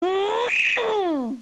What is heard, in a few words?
Scream